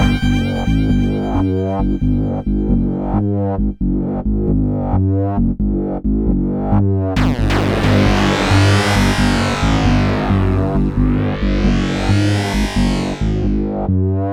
Trancefunk Mutator d, Hardcore Breakbeat 134 F#min; Big Fish Audio; Hardcore Breakbeat Action